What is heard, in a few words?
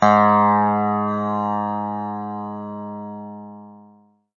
chime